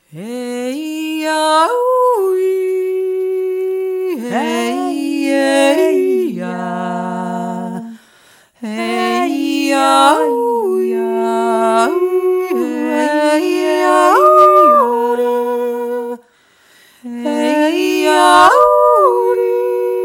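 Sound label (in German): eisschützenjodler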